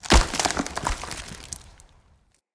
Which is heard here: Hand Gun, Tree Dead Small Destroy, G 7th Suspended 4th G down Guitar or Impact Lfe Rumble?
Tree Dead Small Destroy